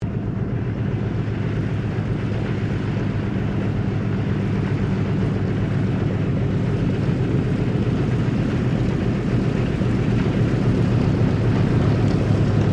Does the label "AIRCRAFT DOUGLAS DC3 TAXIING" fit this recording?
Yes